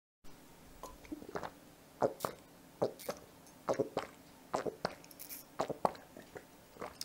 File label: drinking sound effect